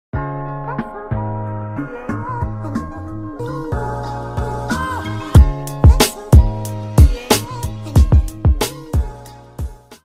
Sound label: 10 second chill music